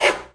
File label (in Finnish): outo, Clangy Crunchy Foundsound Kit